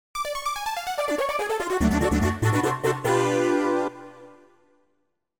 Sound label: Level Intro Fanfare!; bomberman world